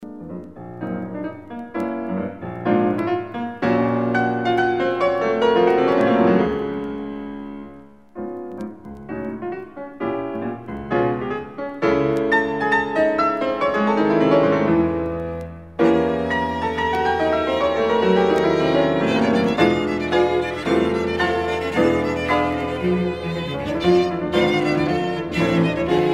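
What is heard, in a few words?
piano trio; novak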